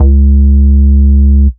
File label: Vengeance Ultimate Bass C#, Root C#